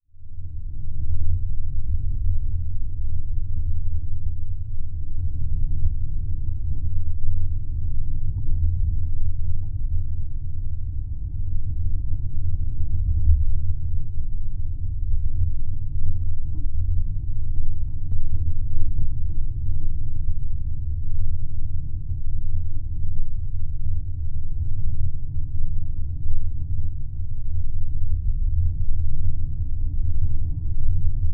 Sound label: Geofon, Drone, Dark, Spooky; underground sounds